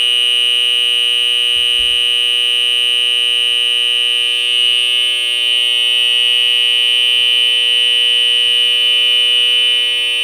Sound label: horn, Federal Signal; 450e